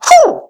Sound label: kick; midori; Android Games: Midori no Tabi